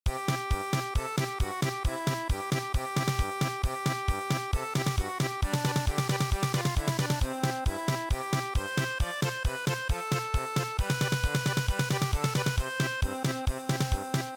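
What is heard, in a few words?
unplugged German Polka; midi; traditional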